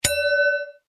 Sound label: sound hall selected